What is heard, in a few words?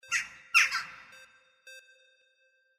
CROW FORREST